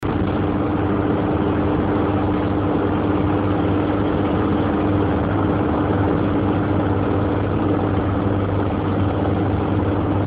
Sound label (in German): 312D Autobahn90